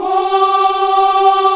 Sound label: VOX African Ahs, African Aahs; Roland LCDP11 Africa VOL-1